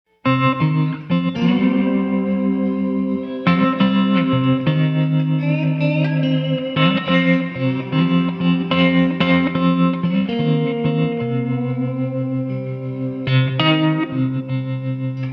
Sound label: 2 Guitars